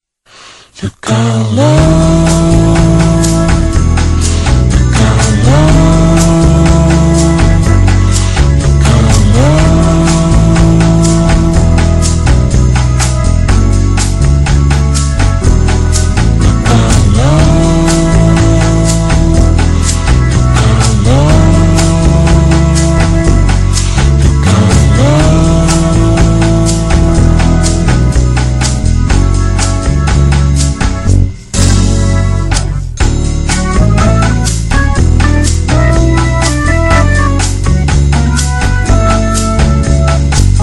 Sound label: elevator